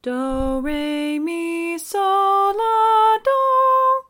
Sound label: Pentatonic Scale in C